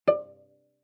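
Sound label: Cello pizz fortissimo sul A D4; MIS Pitches - 2014; Strings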